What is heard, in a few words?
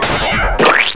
Squish; The Ambrosia Times